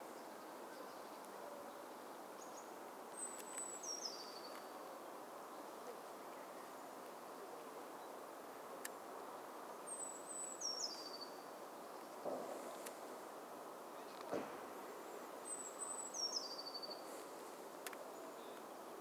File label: forest